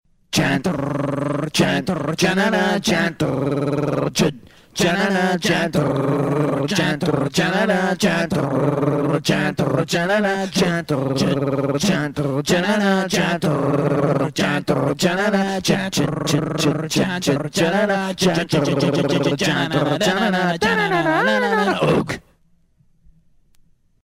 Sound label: lolicore; Lost Frog Productions